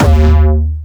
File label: D#2, Jumpstyle Kick; Bassdrums; Jumpstyle Multis; vengeance essential club sounds-3; VEC3 Bassdrums